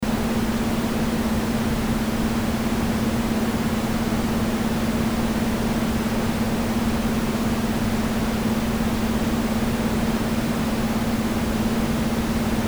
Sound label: Noise Concentration